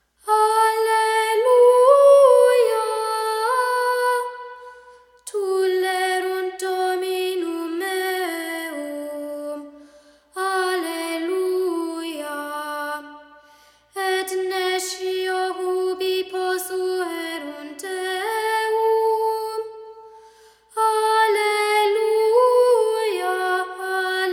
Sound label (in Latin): alleluia tulerunt dominum; Greg Chant; words with wings; Res pro Deo